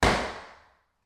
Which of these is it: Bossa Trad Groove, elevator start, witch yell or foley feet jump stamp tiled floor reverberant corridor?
foley feet jump stamp tiled floor reverberant corridor